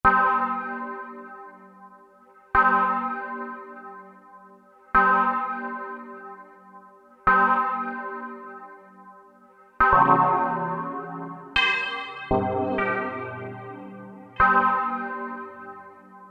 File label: 3D CHURCH, Roland SC-880; Factory Patches